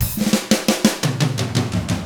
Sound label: 116 SHUFFLER, Drums